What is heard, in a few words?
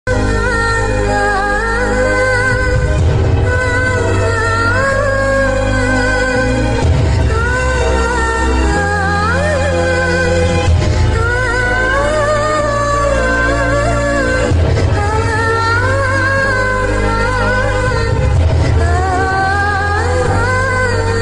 climax heart touching bgm ringtone